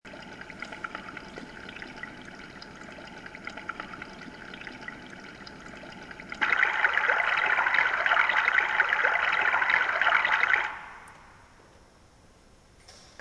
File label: other frog